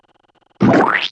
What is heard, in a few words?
squish